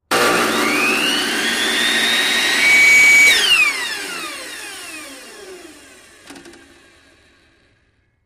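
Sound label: High Speed Large Table, saw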